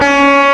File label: C#3 OVER D, GUITAR; AKAI S-Series CD-ROM Sound Library VOL-8